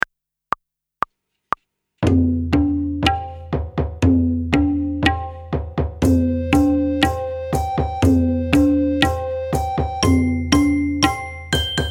Group Piece with tabla

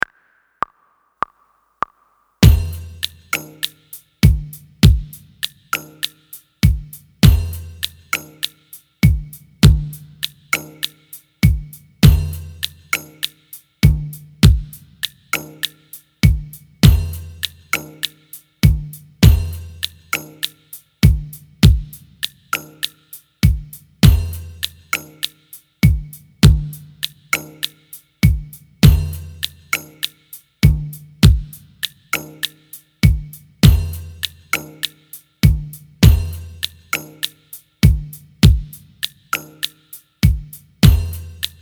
Di Practice with Slide and Da Without Tabla

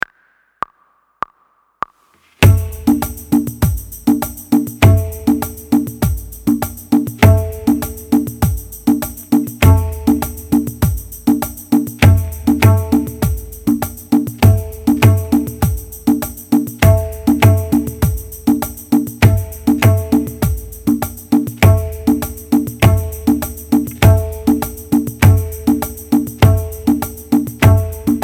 Di practice with tabla

